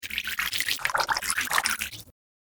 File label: Aqua Droid, robot sound effect; Robot Sound Effects